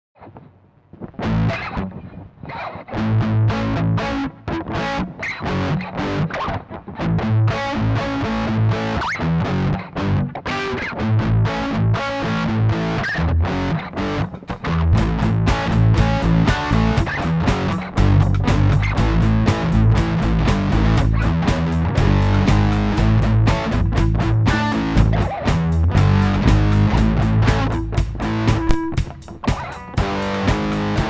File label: Jam, Guitar, Bass; Jamjar